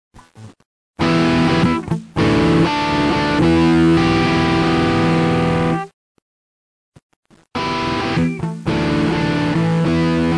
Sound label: Chorus OD